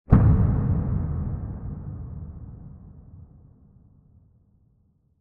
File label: cinematic boom thud tension impact